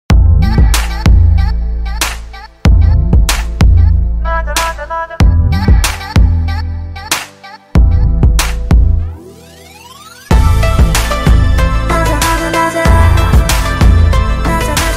instrumental ringtone

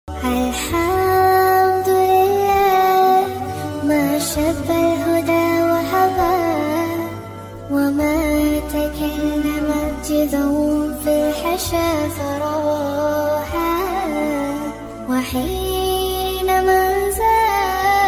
Naat ringtone, islamic ringtone

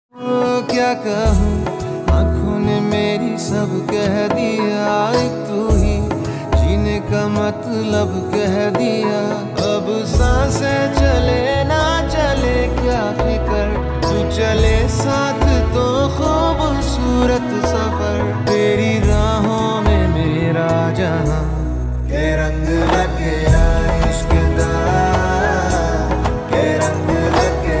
hindi ringtone

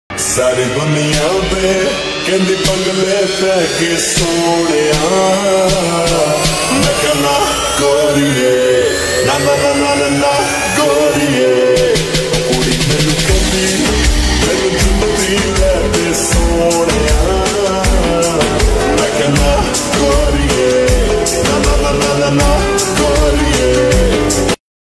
slowed, Punjabi ringtone

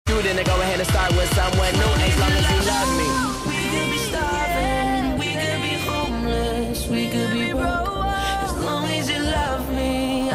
ringtone

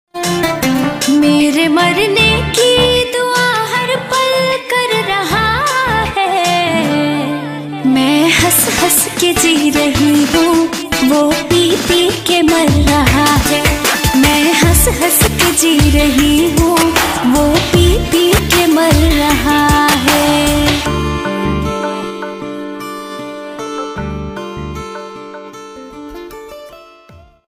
bhojpuri ringtone

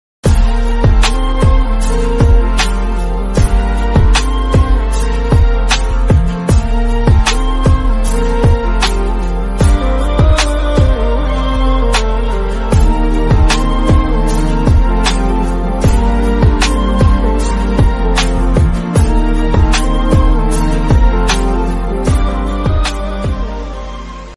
BGM hindi ringtone

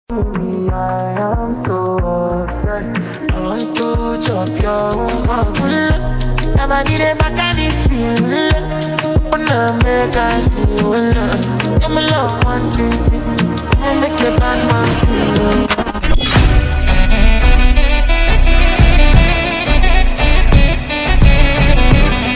mashup ringtone

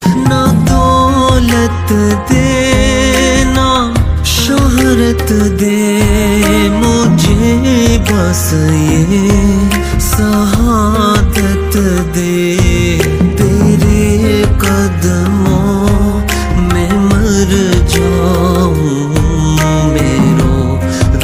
islamic ringtone

